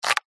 camera dslr shutter speed dial single turn canon eos 6d; technology; zapsplat